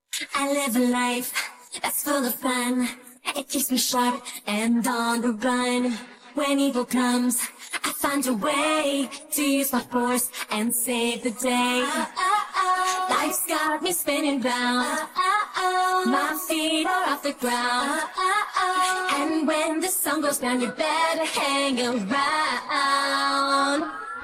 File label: vocals